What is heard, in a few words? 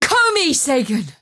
move pain; dota; mirana